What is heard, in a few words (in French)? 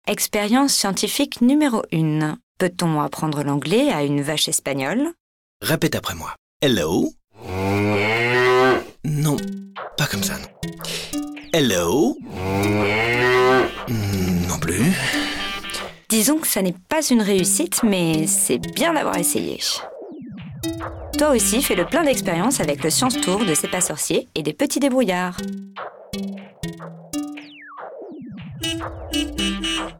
vache espagnole